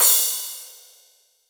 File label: wet open hat sound a key; open hi hat